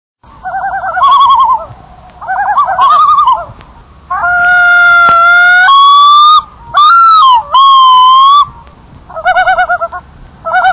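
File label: yellow-billed loon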